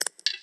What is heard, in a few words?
Unlock; Sharp; SHL21